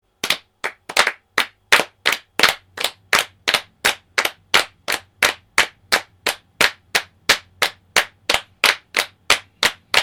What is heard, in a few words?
Clapping Several People